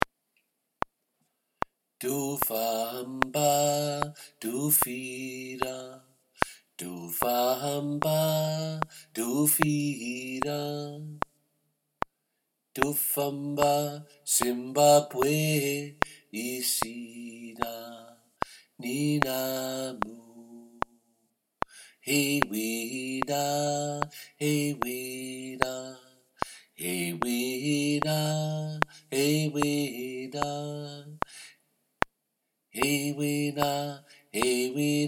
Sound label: Ndofamba Alt